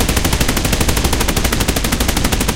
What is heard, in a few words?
loop hispano suiza 30mm, guns tanks; War Thunder